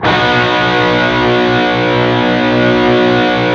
PRS PWR CHRD C 3 L, Guitars

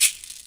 gourd; Greytsounds; EXOTIC PERC

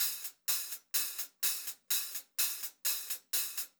126 HH, Pop-Rock Drumloops; USB Soundscan vol.37; AKAI

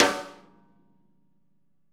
snare rim, Interactive Drum Kits; Sonic Reality

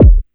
K909 EQ, EQ KICKS; Masterbits - TR-Minator

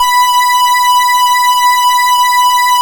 X TRA B6, X TRA SYN

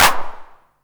909 Clap Tape Sat; Goldbaby Tape808 & Tape909; The Tape 909

